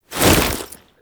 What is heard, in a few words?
Gap Close Jump; Butcher; Warframe; Lasrian Soldiers; Foley; Stance